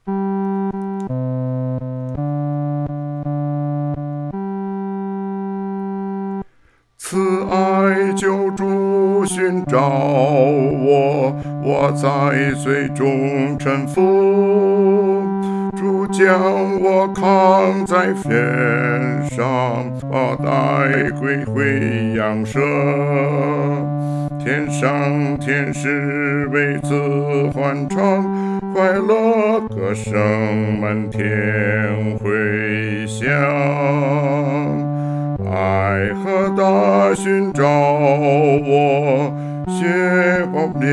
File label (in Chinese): 独唱 第四声